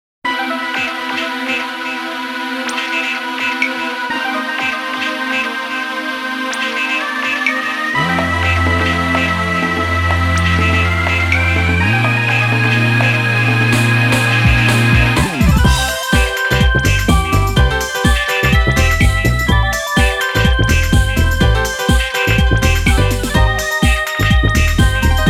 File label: STAFF ROLL